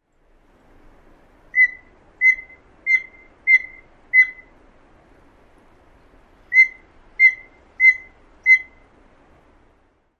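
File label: piping notes worry call, Steller's Jay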